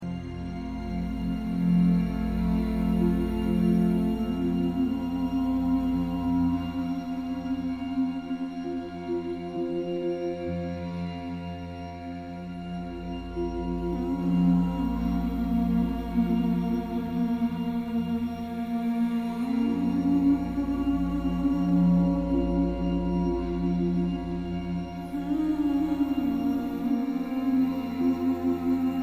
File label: instrumental; Gladiator; آهنگ های فیلم گلادیاتور